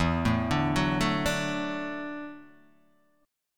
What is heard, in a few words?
E 9th Suspended 4th E down Guitar Open D